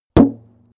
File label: metal impact dull